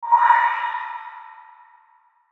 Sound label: Whoosh Filter Flange Reverb; Blastwave; Whoosh Sound Effects